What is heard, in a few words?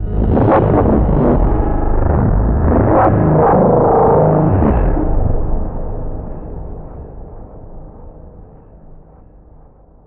fall warp; Half Life Alyx; music; vault